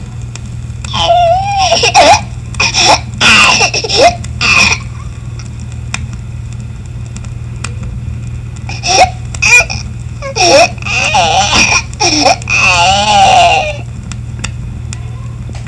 hipotireoidismo, som pediatrico; PEDIATRIA UFF

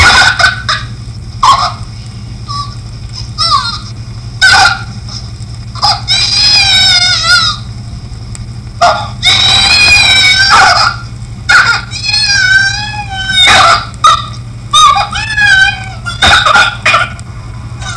cornelia de lange, som pediatrico; SÍNDROME DE LANGE; PEDIATRIA UFF

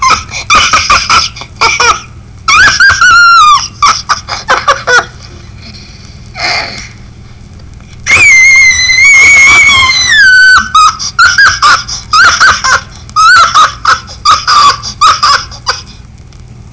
micocefalia, som pediatrico; MICROCEFALIA; PEDIATRIA UFF

